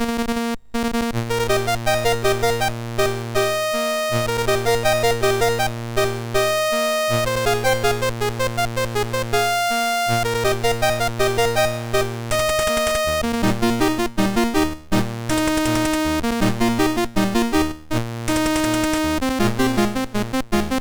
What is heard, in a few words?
Complete Computer Fireworks Celebration Kit, MOS6581R3